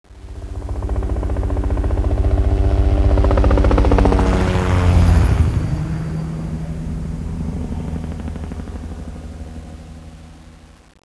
copter flyby; bigcity

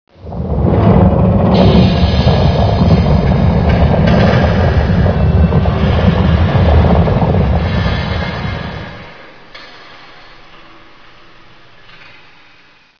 quake; dm borehole

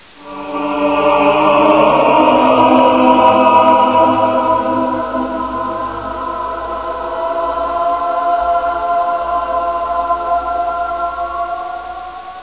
ahh; milan

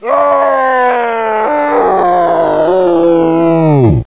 scream weird; svencoop; sectore

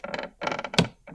wood lite creak; blueorange

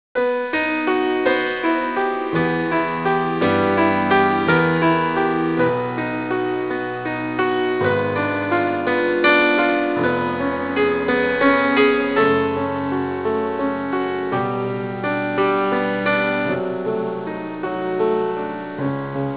piano

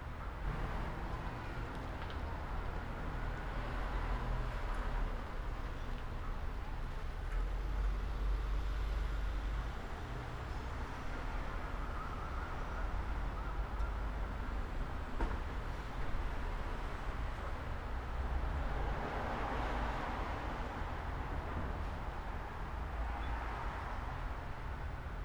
urban day loop

